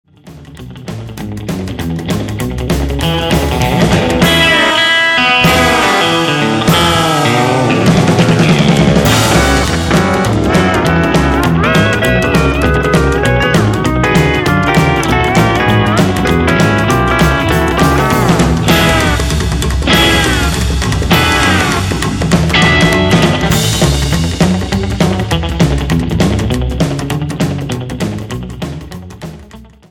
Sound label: Guitar Boogie